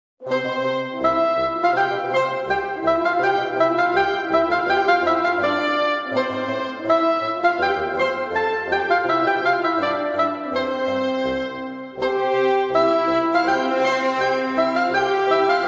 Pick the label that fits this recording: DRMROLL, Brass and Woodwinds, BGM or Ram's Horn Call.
Brass and Woodwinds